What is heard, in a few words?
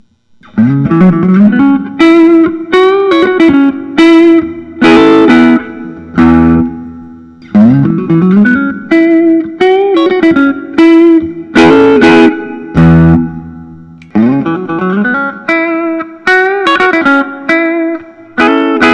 gibson custom 59 les paul reissue; sunrise